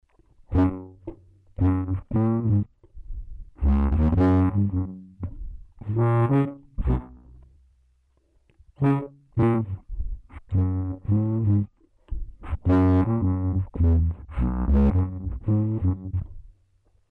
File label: 2 oct down 99 percent, bari bass harp sounds